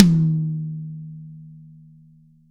DRY KIT, Drums; Real Mega Drums

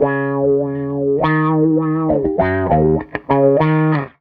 Tele Guitar Loop

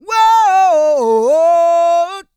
SING, World Voices, CARIBBEAN

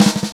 ROLL; East West

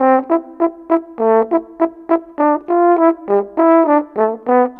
Horn, Cinematic; Sonic Foundry (Sony Creative Software); Orchestral Series VOL-3 - Cinematic

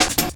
Pickup, Drums & Percussion

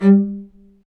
Low Marcato; Roland L-CD702; Combos 1

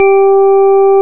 KURZ ORG, organs; Greytsounds VOL-1

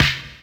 SD, HIP, Dance Mega Drums

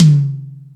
Rock N Roll, E-MU Pro-Cussion

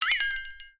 FLEXATONE

